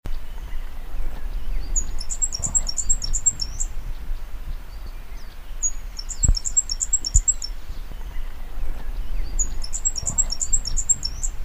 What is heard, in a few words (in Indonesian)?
manyar napoleon